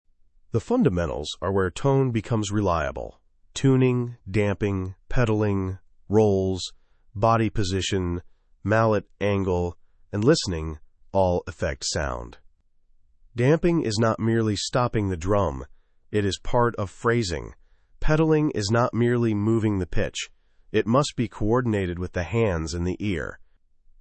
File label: timpani tone guided companion track, timpani stroke and tone